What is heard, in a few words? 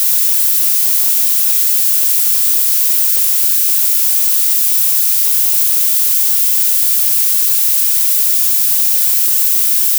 violet, noise